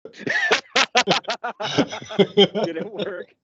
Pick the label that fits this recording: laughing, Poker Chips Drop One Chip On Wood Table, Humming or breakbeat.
laughing